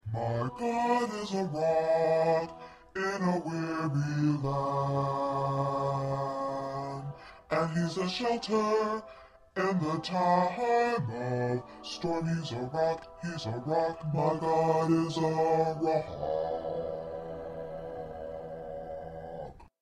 2nd Bass Low

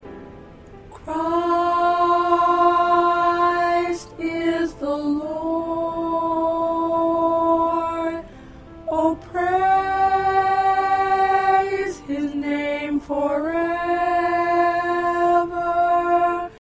Alto, choir parts

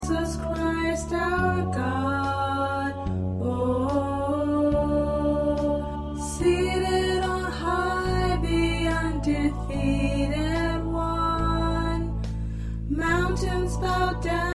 Alto, choir parts

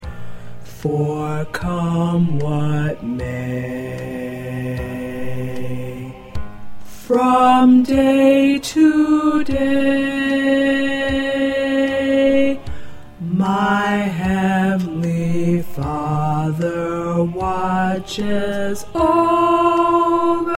Tenor; spirituals and congregational songs

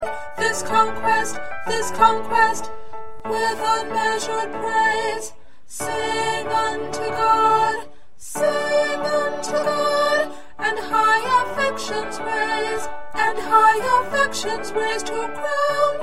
2nd Soprano

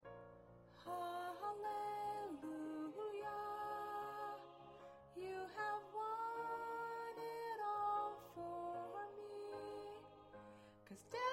Background High

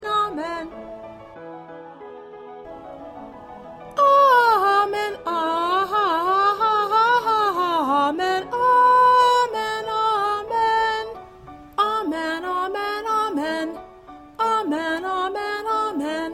Solo Alto

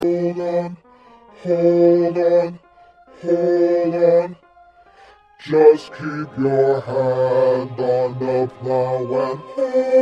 2nd Bass, choir parts

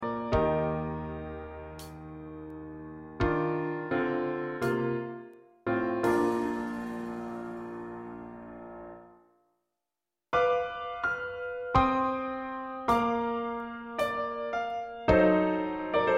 Piano Drum

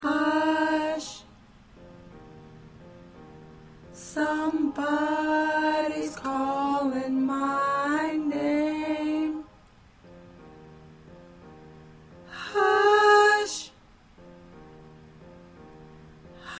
Alto, choir parts

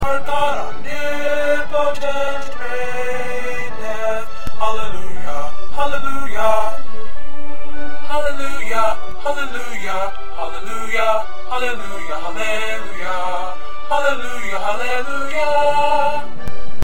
Bass

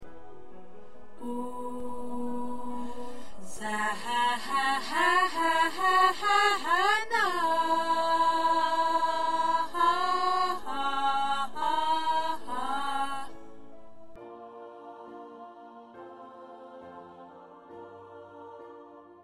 Ensemble Alto

